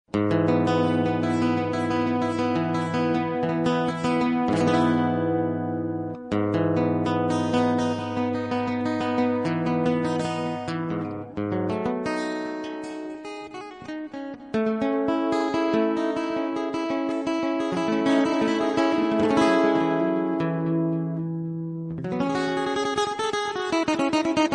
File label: guitar background music tone ringtone; iphone ringtones